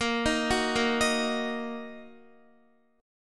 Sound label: Bb Suspended 4th Sharp 5th, Bb, down, Guitar; arpeggiated; tuning 4E,4A,5D,5G,5C,6F